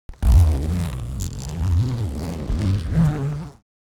Softshell suitcase zipper

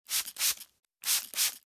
Spray from a glass spray bottle

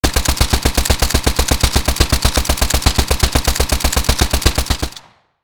AK-47 assault rifle full auto fire; gfxsounds

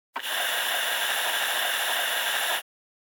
Gas mask oxygen refill or release; Military Equipment

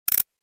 Click error

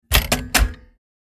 Steam lever machinery mechanical push turn on

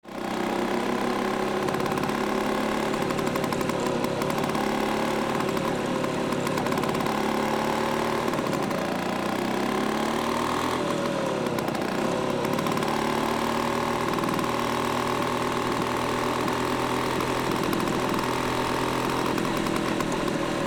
Scooter driving around city loopable

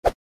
Battle melee accent sword swing

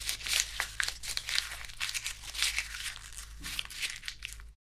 Cows eating grass

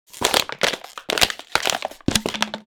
Impact of plastic bottle in trash can

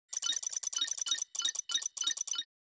Hologram interface data scanning and activating

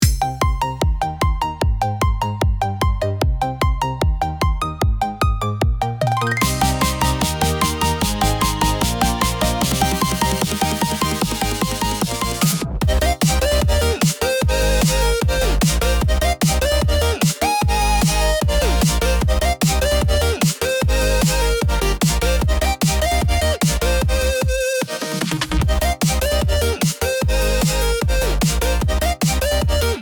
Gaming adventurous

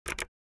Optical keyboard keystroke pressing spacebar

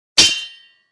Medieval battle axe against sword impact

